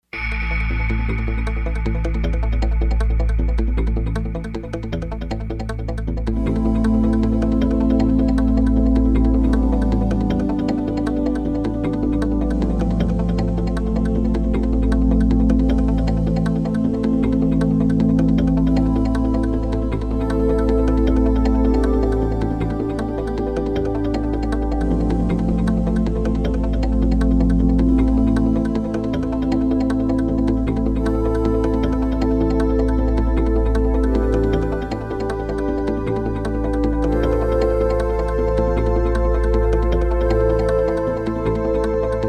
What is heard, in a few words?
Gingle